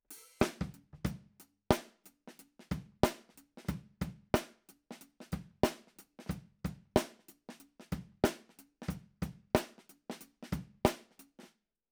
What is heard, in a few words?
ROOM mono